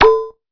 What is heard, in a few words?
failure; VCDEasy